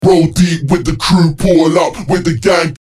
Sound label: Hype Predrop Roll Deep FX